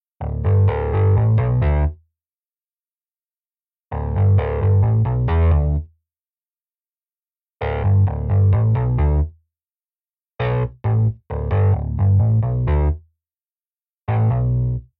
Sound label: Bass Mixed